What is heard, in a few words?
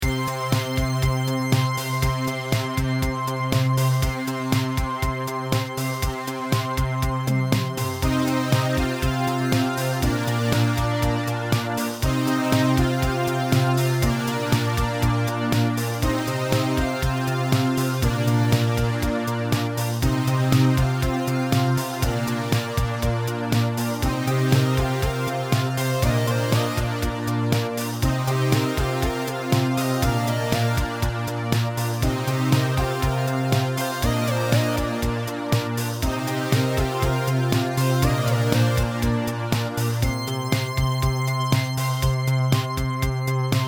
Action Synth